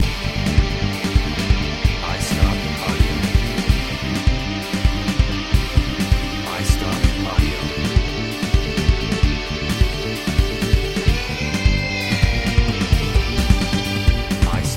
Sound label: victory loop